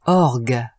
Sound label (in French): orgue